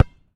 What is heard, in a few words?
Street Ball Bounce Dirt, Street Basketball